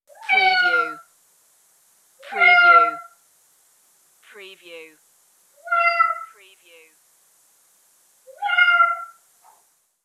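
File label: Cat Meow Multiple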